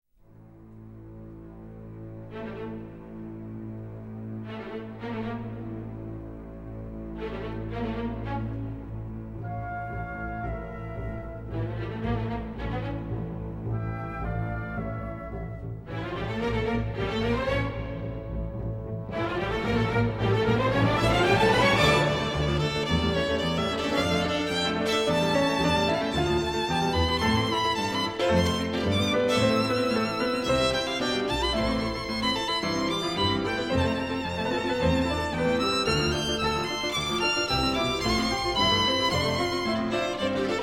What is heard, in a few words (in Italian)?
Finale di un concerto romantico interrotto per violino, pianoforte (in canone) e orch; violino, pianoforte (in canone) e orchestra